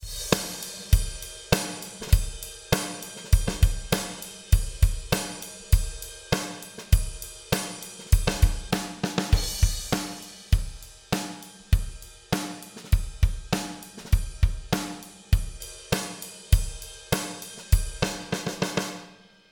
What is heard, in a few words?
drums phase out in out